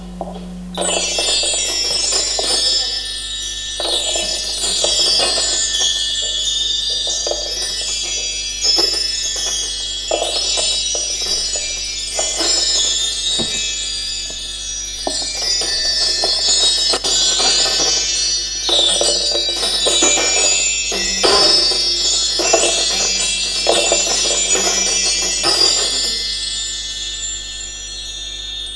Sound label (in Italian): scacciaspiriti